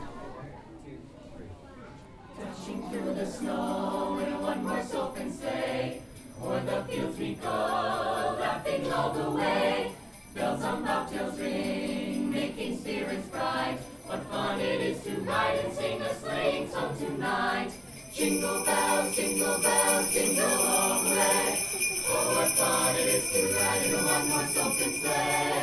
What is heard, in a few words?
Jingle Bells